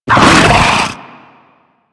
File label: Infested Ancient Attack Grunt, Enemies; Warframe